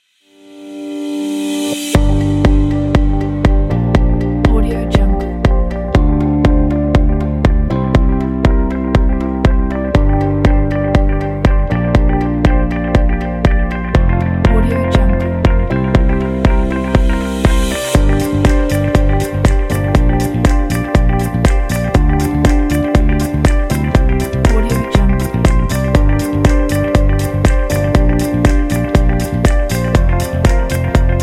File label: music sound